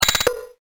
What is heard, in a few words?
RATCH POP